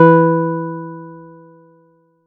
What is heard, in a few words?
Chord; ModelCycles